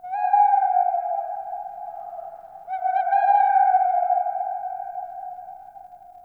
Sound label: Night Creature Distant; Ambience; Night One Offs